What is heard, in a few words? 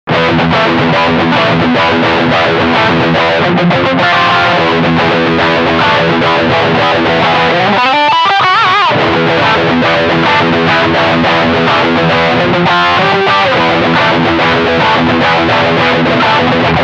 High Gain; ISF USA